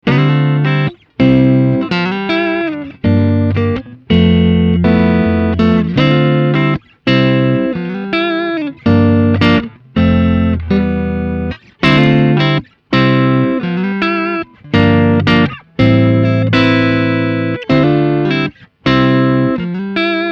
Jazz Linesignal

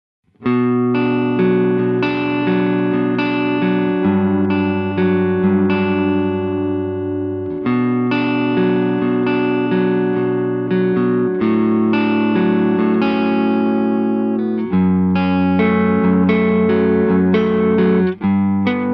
Korg NuTekt TR S Tone Mittig Low Gain P 90

